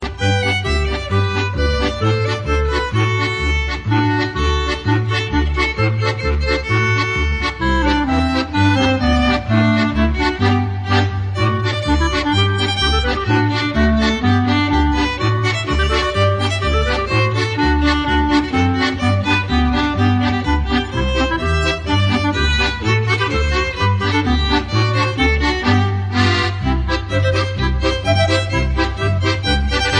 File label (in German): Polka; Allemande